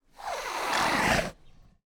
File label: tiger hiss; prosoundfx